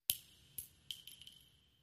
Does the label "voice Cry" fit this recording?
No